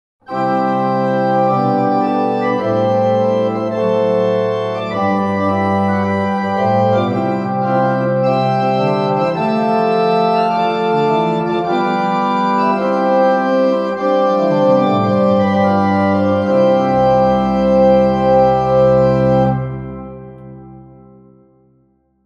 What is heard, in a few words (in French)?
plein jeux